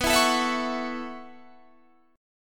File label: B 9th Suspended 4th B down; B9sus4; strummed